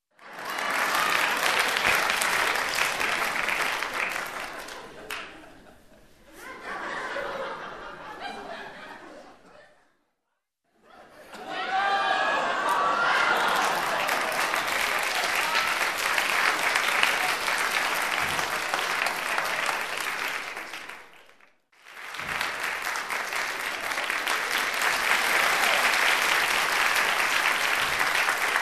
Laughter and applause in the room; standing ovation

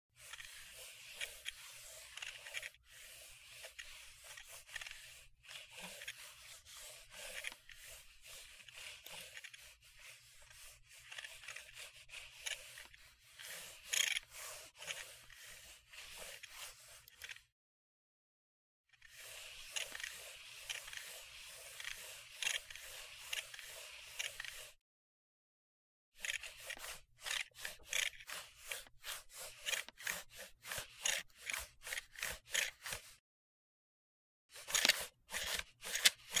Computer mouse movement

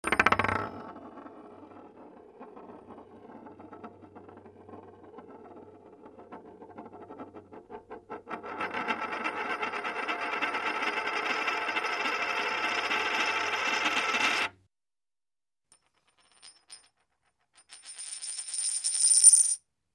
Spinning a coin on the table; Spinning a coin on the table 2

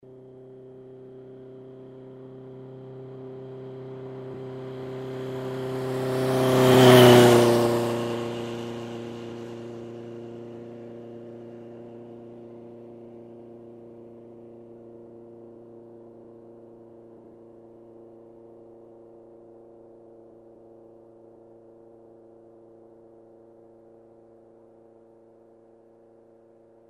Motorcycle passes by

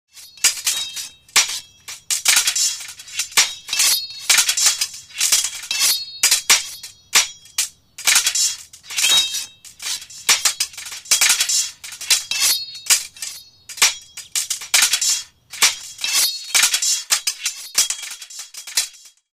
Two people sword fighting; cold weapons

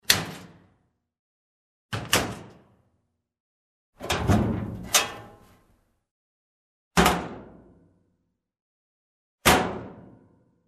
The metal cabinet door opens, closes

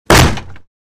Wooden door slams shut

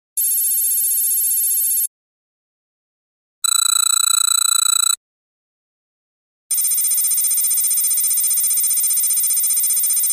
information read signal; radio signals

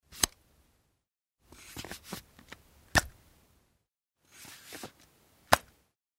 Army knife gets out, sheathes; cold weapons

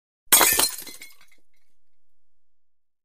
glass breaking

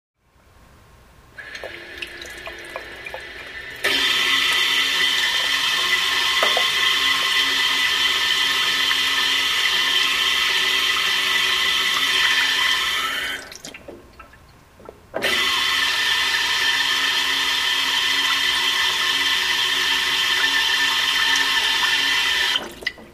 Water flows into a metal sink

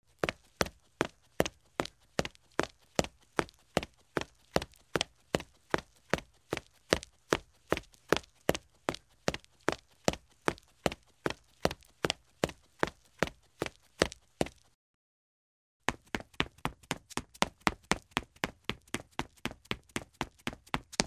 Running on a concrete floor in shoes; steps

